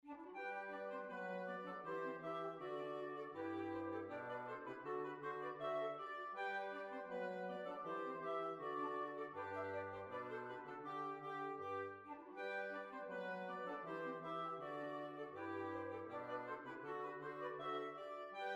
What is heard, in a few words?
Hornpipe Morpeth Rant